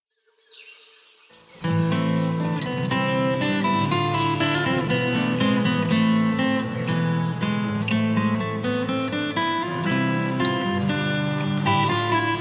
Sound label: Quiet Medieval Night